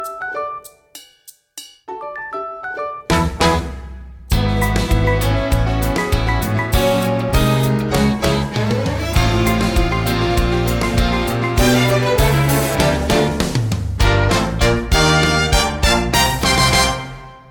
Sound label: Audition Track no vocals; theatre auditions